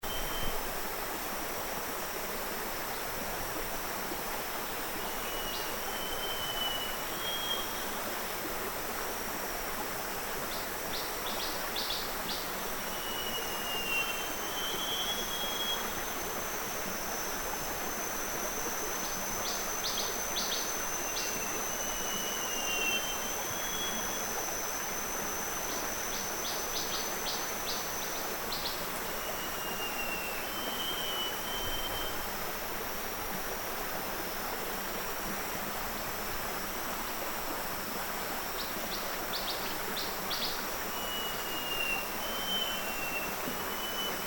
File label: upper Baleh after the rain near a stream 6pm